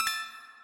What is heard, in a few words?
collect gem